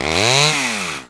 saw rev, grinder; PC Gamer IT CD 45; SMANDEMO; baddys

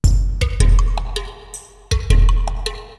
DRUM LOOP ambient 160

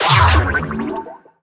incubator birth; darwinia demo

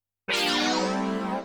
SYNTH HOUSE 125 A; eJay; Keys

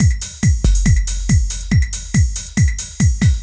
beat

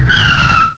skid

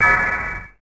Percu; alone in the dark 4

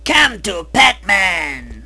PAD; JK2; padstation; paddys

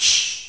Mine Dud; worms2

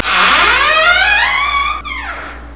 open door; szenarion

